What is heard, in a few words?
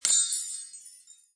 stardust Pick; merge-dragons-2